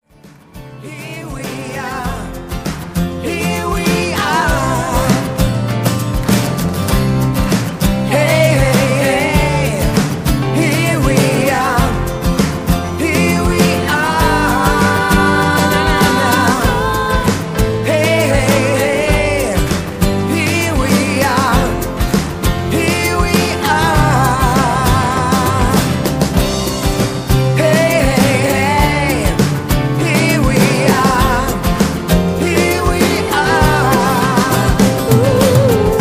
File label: unplugged, rock